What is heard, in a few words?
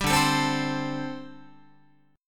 D 7th Suspended 4th, down, Guitar